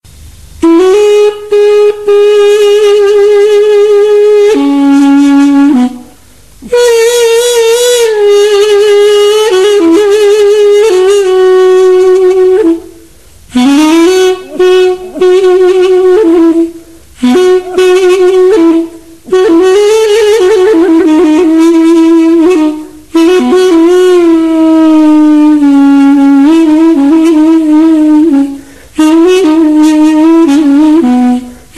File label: balaban